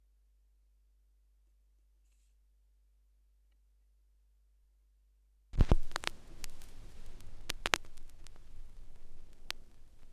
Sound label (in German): schallplattengeraeusche